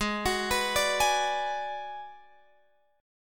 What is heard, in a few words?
Ab Minor 7th Flat 5th, Guitar, Open F; arpeggiated; x,15,18,18,17,15; down